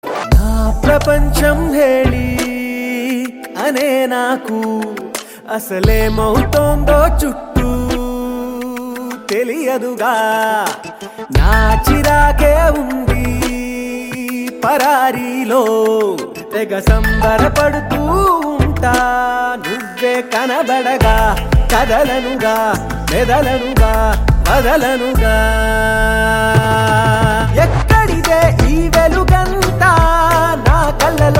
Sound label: Humming